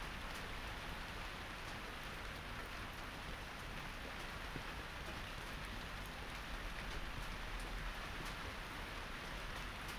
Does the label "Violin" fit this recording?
No